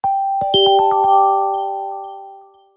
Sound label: ringtone, htc, short